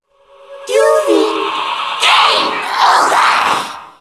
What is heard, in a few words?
dark zm idle, zombie; zombie plague